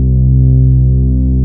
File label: triangular, synths; Notes